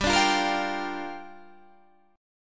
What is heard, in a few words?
Bb 6th G